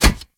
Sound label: Step Metal, Footsteps; Warframe; Kubrow